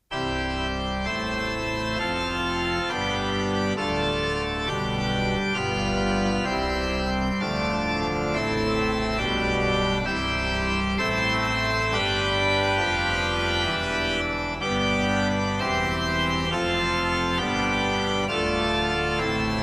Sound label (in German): Hymne